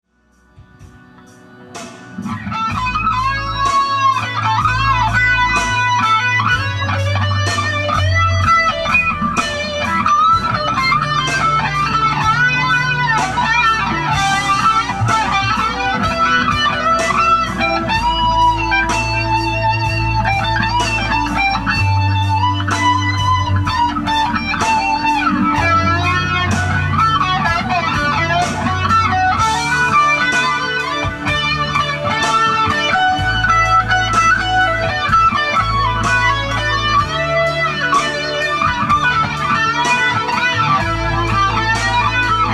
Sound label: Jam Clip